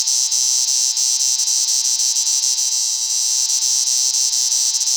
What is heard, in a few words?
broken light loop; Ambience; Props; Warframe; TheNewWar; Zariman